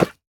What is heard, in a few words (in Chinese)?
犰狳受伤减免声